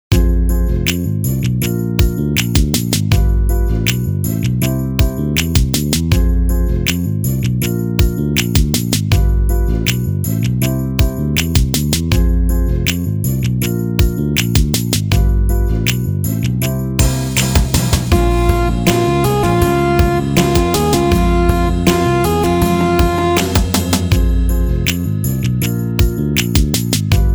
instrumental

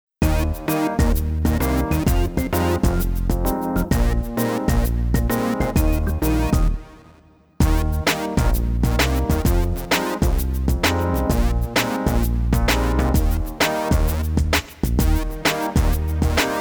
instrumental

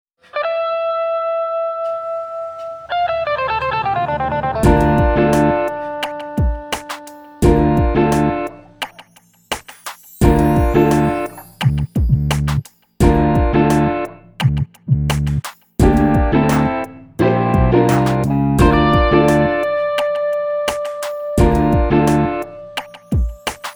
instrumental

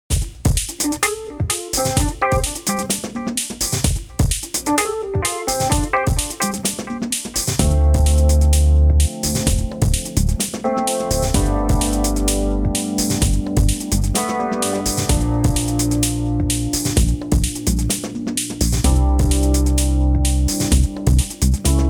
Wo bist du gewesen instrumental

